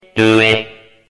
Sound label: vault hit stinger; sinners